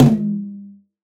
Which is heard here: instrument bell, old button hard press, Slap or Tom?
Tom